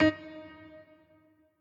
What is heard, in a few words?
piano riff intro